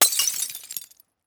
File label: bottle broken